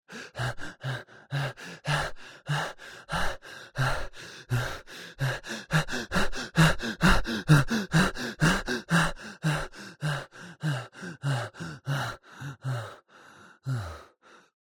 HUMAN BREATH Male Mouth Inhale and Exhale Like Got Frightened Intermittent; Articulated Sounds; Human Male Breathe